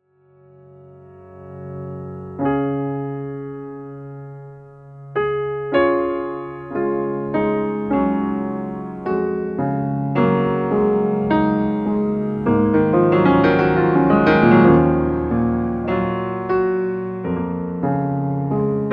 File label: solo piano